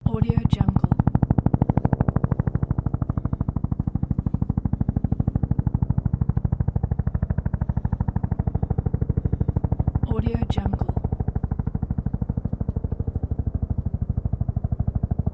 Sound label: helicopter hovering overhead with heavy low end chopping